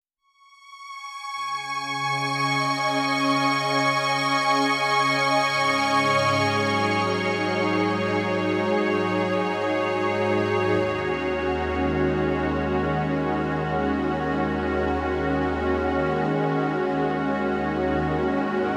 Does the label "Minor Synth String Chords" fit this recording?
Yes